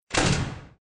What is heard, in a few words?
Door